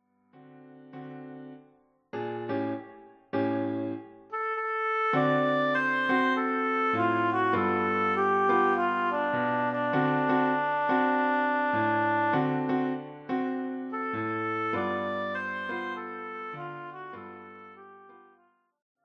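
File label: Oboe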